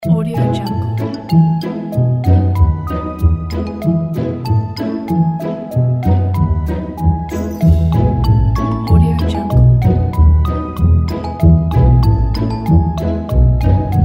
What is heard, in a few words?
fun family game